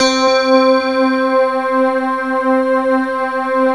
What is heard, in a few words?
SYN, Roland, SYN DIGITAL; Sound & Vision - Gigapack I CD 2